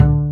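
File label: double bass D-2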